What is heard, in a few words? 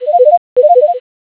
ringer